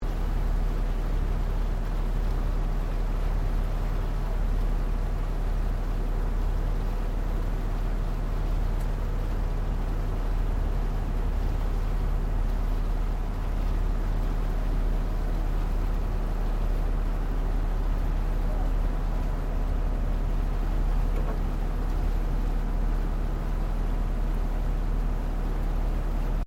Boat Water Taxi Large Williamstown Slow Loop, Large river water taxi; Boats (Sound Effects:Vehicles)